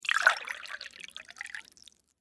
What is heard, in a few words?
Water Pour Chalice, Into, Metal, Cup; Pouring; BlastWave